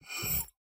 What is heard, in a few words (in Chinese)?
球员技能 洗炼; 升级 装备 技能; nba